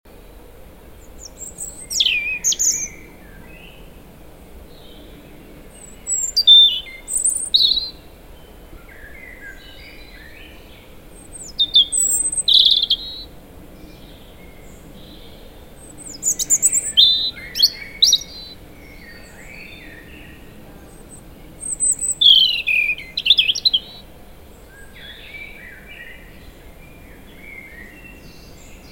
Erithacus rubecula; SETE

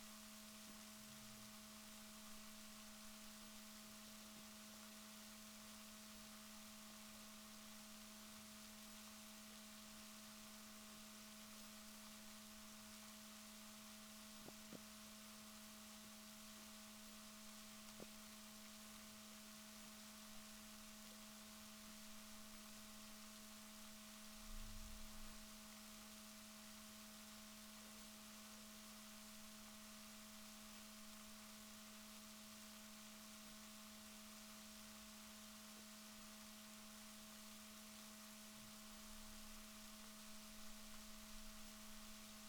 rorquals positif, Balaenoptera Physalus Chile

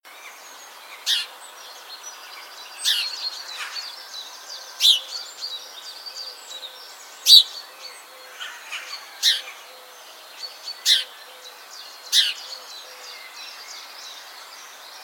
Passer domesticus; SETE

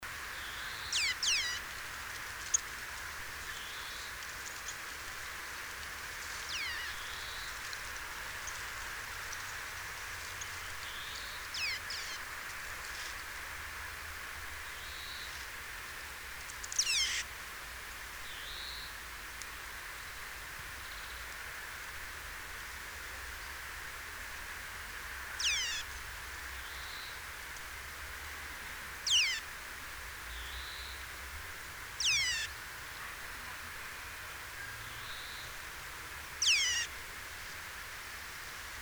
Sitta europaea; SETE

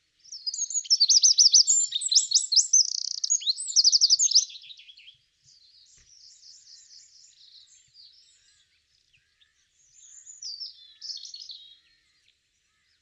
Troglodytes troglodytes; SETE

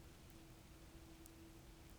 pulses, BALAENOPTERA; MONACO 2022-07

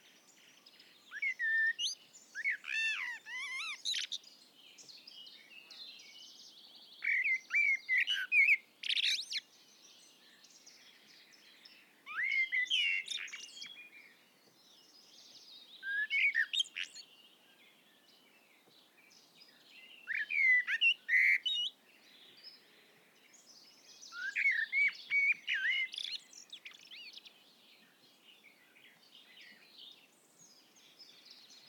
Turdus merula; SETE

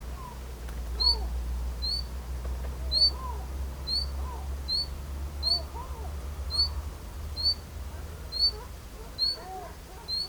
Luscinia megarhynchos; SETE

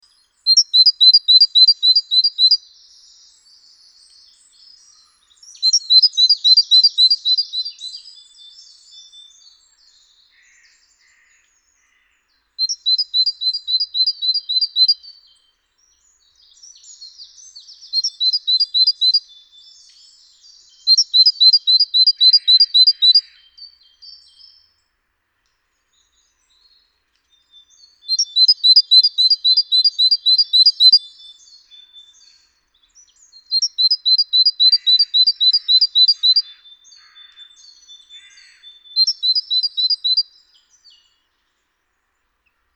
Parus major; SETE

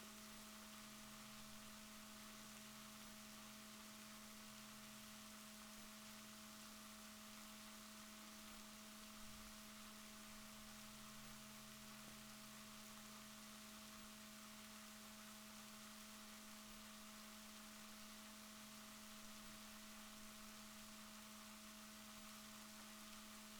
rorquals positif, Balaenoptera Physalus Chile

